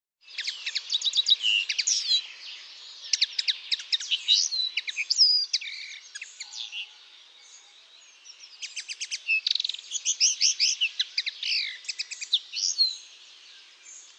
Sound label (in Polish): MAKOLAGWA, birdsounds